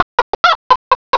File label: chicken